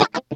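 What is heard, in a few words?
Scratch Guitar; Multisamples; Digital Sound Factory Xtreme Lead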